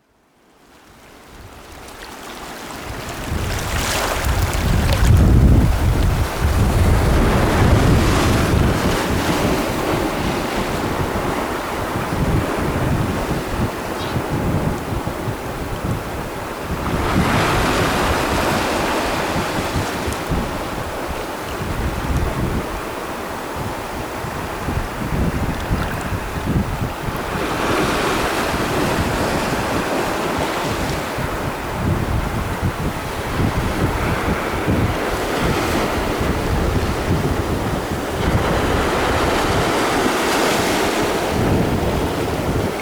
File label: caribbean surf